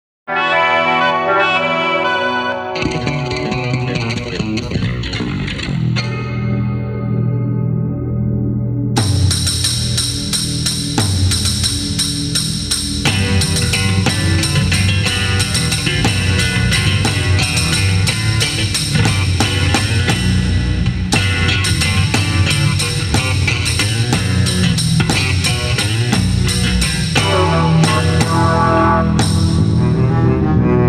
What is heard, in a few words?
bas solo